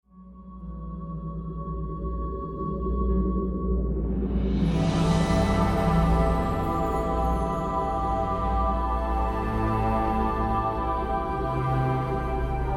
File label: mystical ambient